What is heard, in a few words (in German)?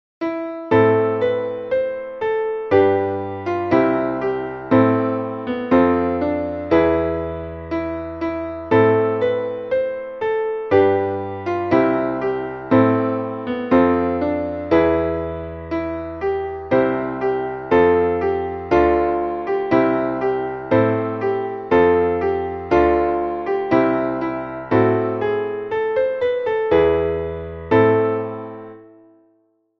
klavier ich steh an deiner krippe hier